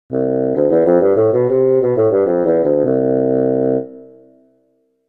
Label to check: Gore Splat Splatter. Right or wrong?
Wrong